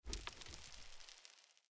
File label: Frescoes; Blind Quest - The Frost Demon